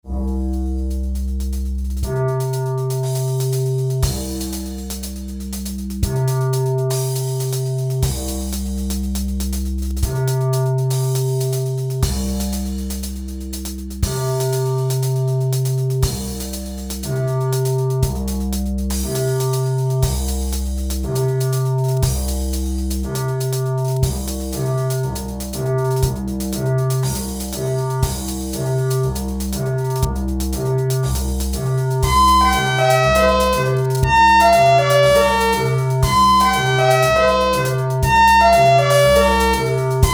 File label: drums